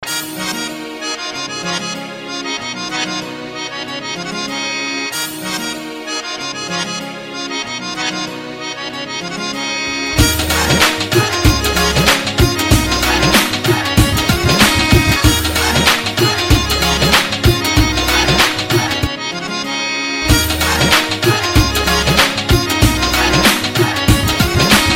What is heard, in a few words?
Desi Tone